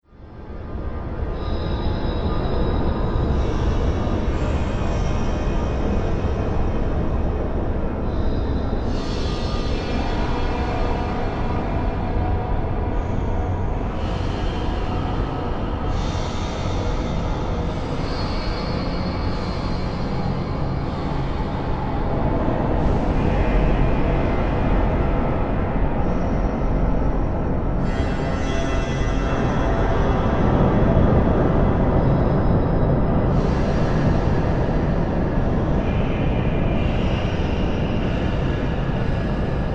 Soundscape Future City, Science Fiction Sound Effects